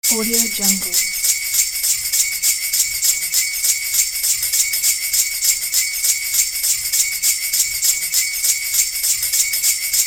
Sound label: christmas sleigh